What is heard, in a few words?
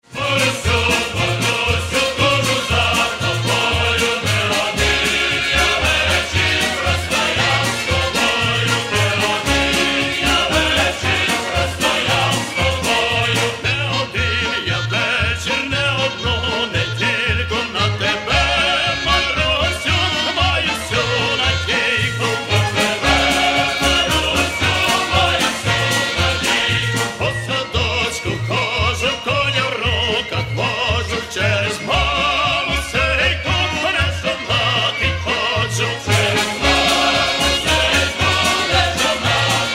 Folk Choir; Volyn